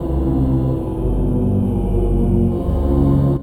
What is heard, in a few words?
Strings Drkstck; Sonic Foundry (Sony Creative Software); Knutrix Drum & Bass